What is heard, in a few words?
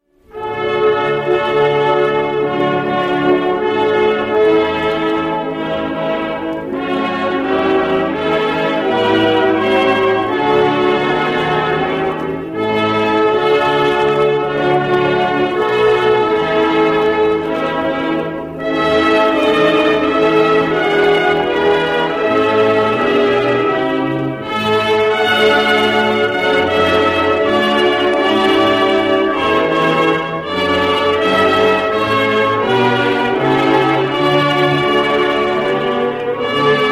America The Beautiful Sounds Car Horn